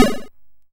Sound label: YM Arp B; chip hits; arps; CHIPSHOP DELUXE